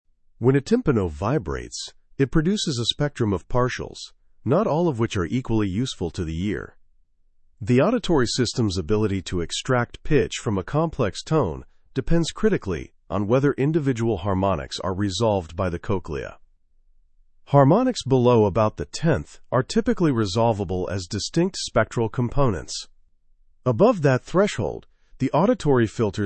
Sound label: timpano resolved harmonics; timpani pitch